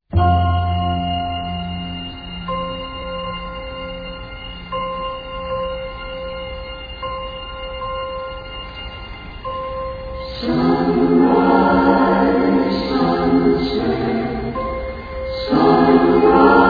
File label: Waltz 29 bpm